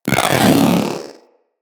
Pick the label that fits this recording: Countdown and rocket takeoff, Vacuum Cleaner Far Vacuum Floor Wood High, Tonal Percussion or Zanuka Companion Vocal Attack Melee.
Zanuka Companion Vocal Attack Melee